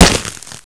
concrete; GoldSrc

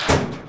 random box close; GoldSrc

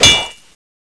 sword world; GoldSrc

